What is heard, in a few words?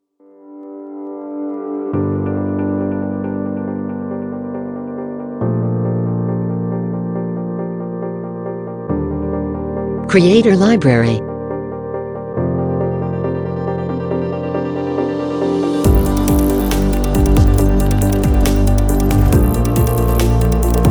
Emotional, Experimental, Abstract music